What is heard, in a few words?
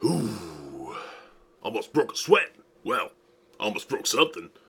taunt